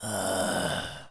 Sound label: Zombies Male No Use; zps; Male1; No Action